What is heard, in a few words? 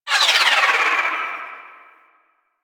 Pistol Proj By; Weapons; Warframe; Drifter; Operators